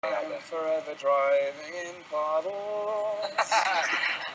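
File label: driving in puddles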